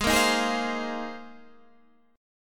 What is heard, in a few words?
A sharp 7th Suspended 2nd Suspended 4th